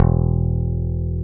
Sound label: E1, BASSES, Roland